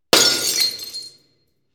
Breaking Glass

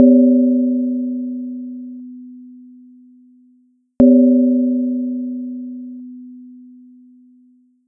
Traditional Asian Percussion

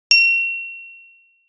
Crystal Glass Clink

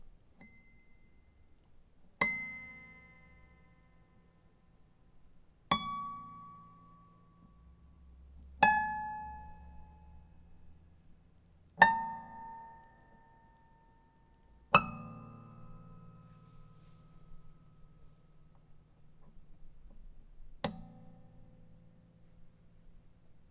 Above Neck Strings

